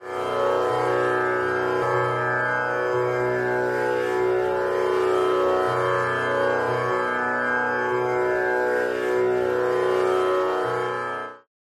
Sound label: tanpura drone b binaural